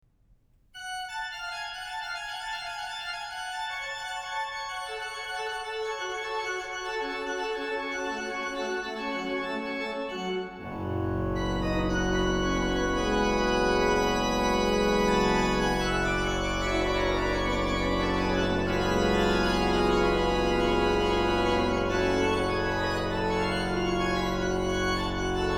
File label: Praeludium in F Sharp Minor; Buxtehude; BuxWV 146